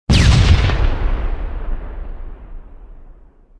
other hit, ships combat

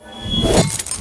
sword, combat

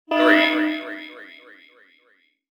self destruct three, ships combat

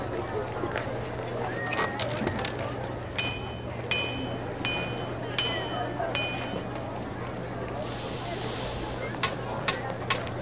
forge, ambiances

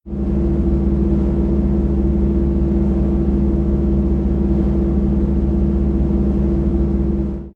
power, comets

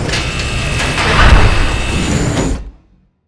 close airlock, ships; Cosmic Rage; ships misc

